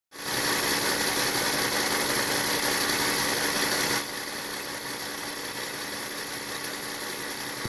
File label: rattling noise catalytic, azera rattle; hyundai